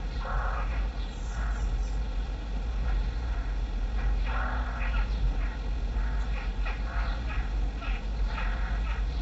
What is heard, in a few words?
Ambience Loop, outdoor